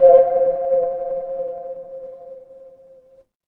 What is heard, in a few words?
instrument singing bowl